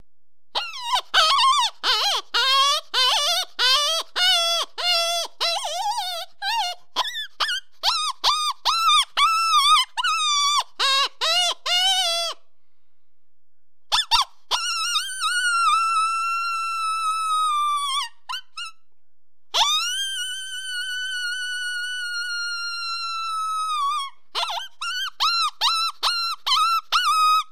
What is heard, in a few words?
horn tip open